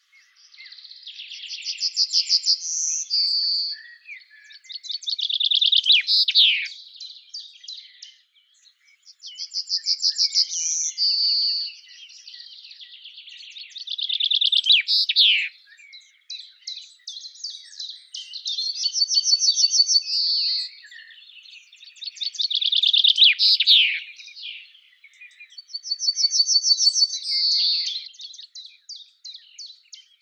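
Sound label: chaffinch and bunting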